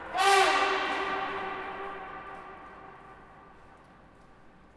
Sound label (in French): Barissement Elephant Zoo; Zoo Beauval